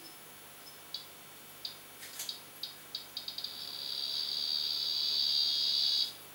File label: Pipa parva